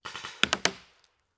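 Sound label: Paintball Gun Impact; Sound Ideas; Guns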